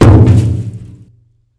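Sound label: grass; Mafie 1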